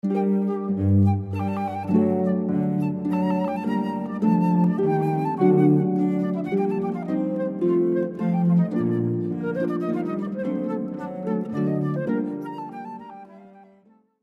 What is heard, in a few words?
Allegro, Handel ob. concerto, harp flute cello